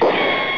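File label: ricochet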